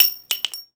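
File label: shell bounce large, weapons, shells; ModernWarfare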